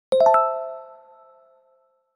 system master volume reference

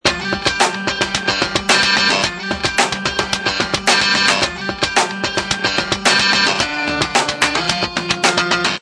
Strummer, Ringtone; HTC Freestyle

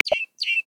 Medium Tree-Finch, Galapagos; Xiaomi Redmi-8A

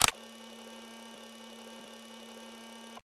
loop; LG; G2-Mini; camera

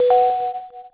video start; LG VX9800 The V

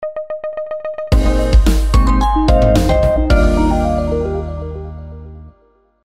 shutdown; LX600 Lotus

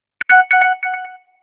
message alert

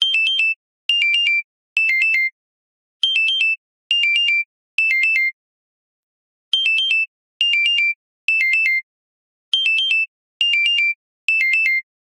Alarm, phonetones alarms; LG X power 2

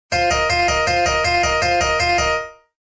Dulcet Tone; Samsung SGH-i560

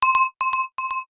bell, notifications; ZTE nubia Z20

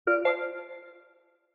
out, notifications

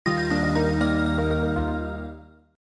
Power Off, phonetones system; GM600

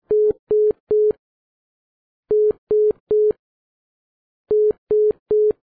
dropped; Samsung SGH-i620

